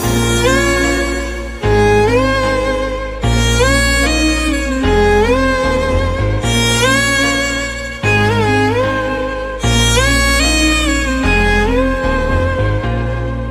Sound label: Instrumental Tone, Telugu Ringtone